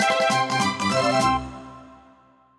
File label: jump; Magikarp